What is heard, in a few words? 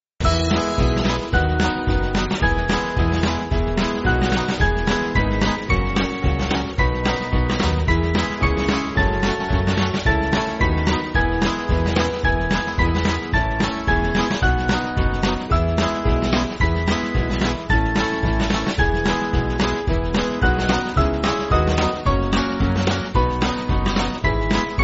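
Band, Music Backing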